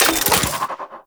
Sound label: Mech, Spear; Warframe; Tenno; Tenno Priest Spear; Scourge Prime